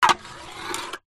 food drink can soda empty scrape wood floor

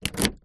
vehicles car key insert into door lock; zapsplat

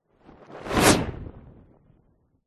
superhero fly by fast whoosh cape; zapsplat

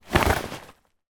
foley bag ruck sack pick up; zapsplat

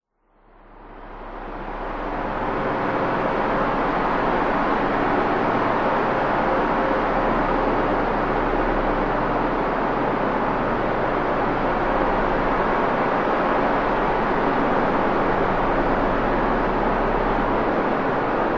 sound design drone noisy traffic like constant; zapsplat

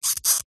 cartoon squeak

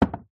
spice jar glass small set down on wood shelf; food; zapsplat

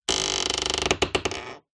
household door creak

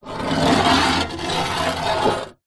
foley metal rod drag scrape on rusty metal table; zapsplat

